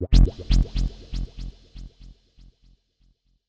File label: sound wall